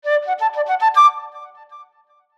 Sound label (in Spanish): flauta